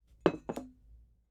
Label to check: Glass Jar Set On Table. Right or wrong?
Right